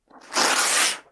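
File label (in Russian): разрыв бумаги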